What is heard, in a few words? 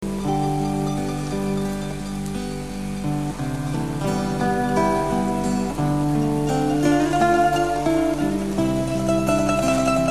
aleluja